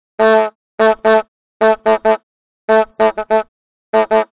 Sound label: Horn Car; Mittelheim